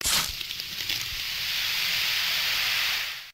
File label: Bottle spill; RedDot